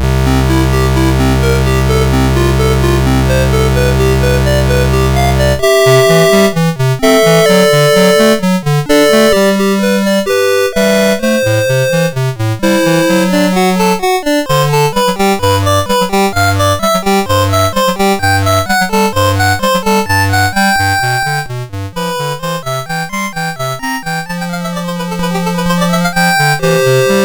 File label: Little Prelude in C Major, MOS6581R3; Lord Myrkul